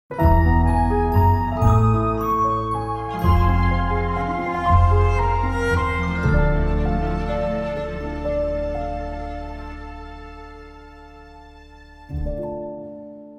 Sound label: Magical Themes Magical Melodic Logo; Artlist Original